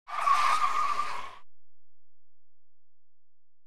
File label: COLLISION WHEEL CONCRETE, Wood Skid Dry; SIMUZENSOUND